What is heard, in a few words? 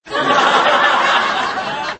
canned laughter